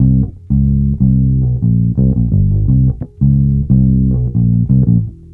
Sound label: Bass Loop, Ambient Grooves; Sonic Foundry (Sony Creative Software)